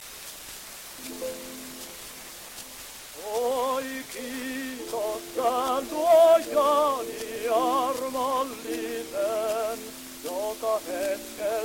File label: Gramophone